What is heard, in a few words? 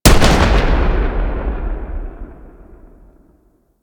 Torpedo Explosion, weapons; Grn Torpedo Pistol